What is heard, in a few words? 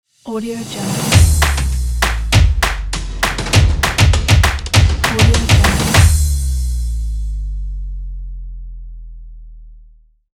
percussive logo